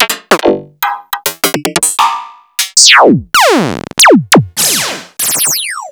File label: Yamaha Motif ES-Series electronic & zap; Sliced